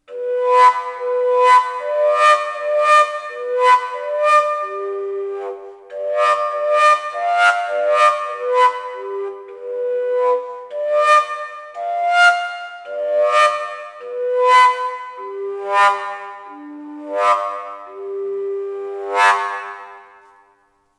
whoosh g, fujara

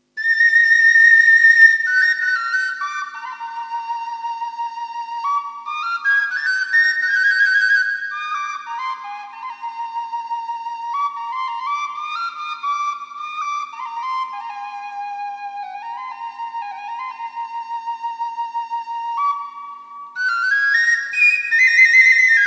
pstrusa pipe, whistle

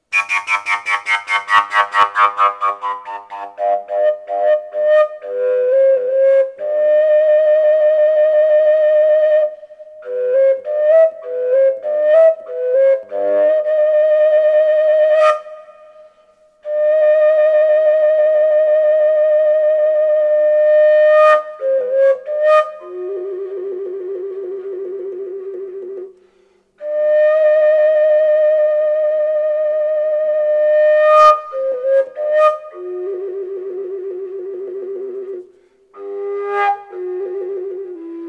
fujaridoo locust g; folkart slovakia

